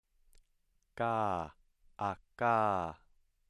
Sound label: voiceless velar stop